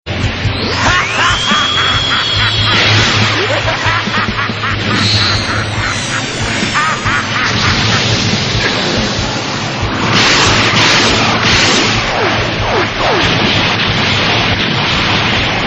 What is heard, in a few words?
Ki Blast; Broly